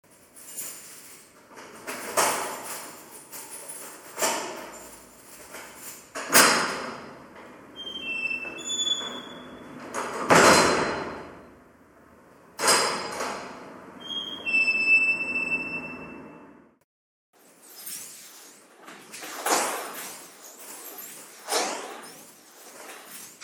Porte tpo 114

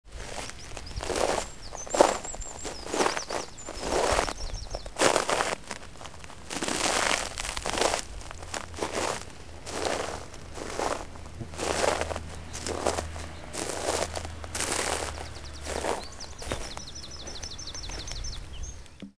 Pas algues seches

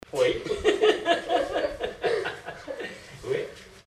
Rire Imzzoudar

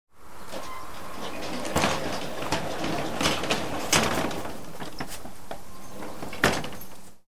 Porte de garage

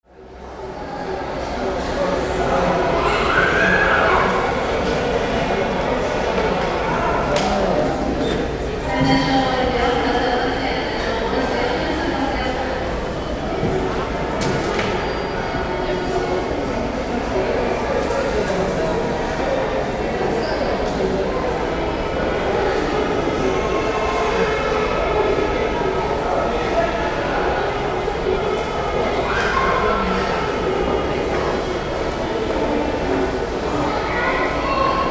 Aeroport Hall Moyen

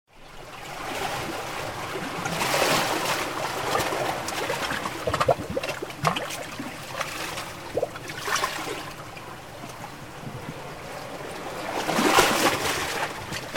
Mer Calme

